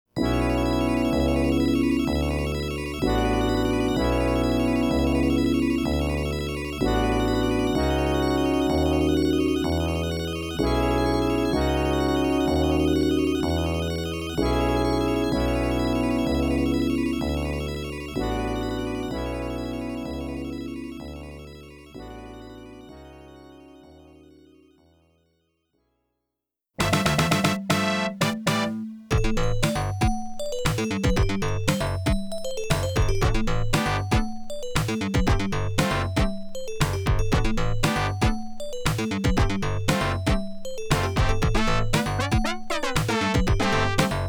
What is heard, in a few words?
Start Demo ~ BGM1 ~ Stage Clear; CUE BRICK